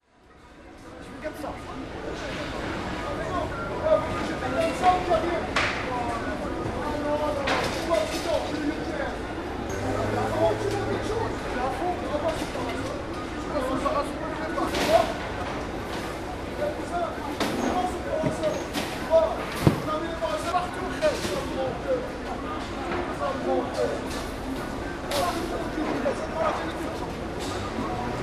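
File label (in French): marché; MZ-N710; Croix de Chavaux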